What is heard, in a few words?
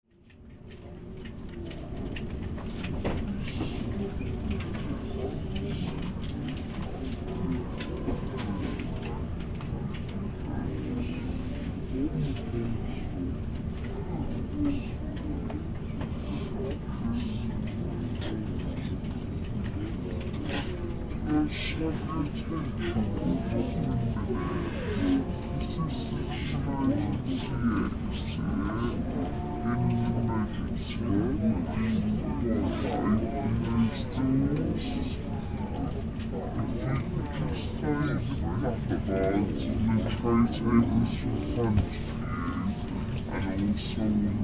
Aircraft interior stationary passengers chatter; Airplane Sound Effects